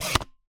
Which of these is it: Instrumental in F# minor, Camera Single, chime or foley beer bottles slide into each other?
Camera Single